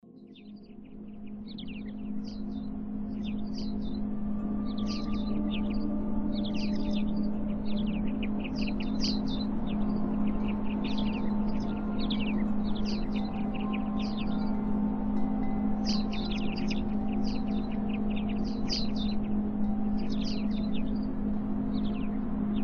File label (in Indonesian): audio terapi binaural dengan brainwave